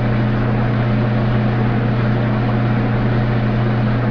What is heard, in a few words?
dryer, laundry; cstrike